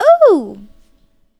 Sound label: Vocal, Infinite Soul; Sonic Foundry (Sony Creative Software); Vocals